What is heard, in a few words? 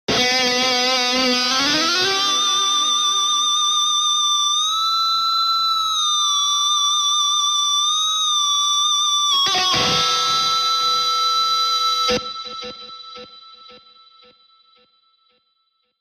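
Electric Guitar Going Feedback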